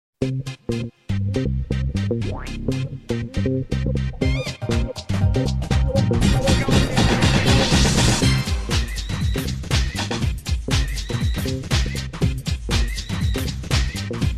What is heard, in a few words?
Rhythm; Fuzion Frenzy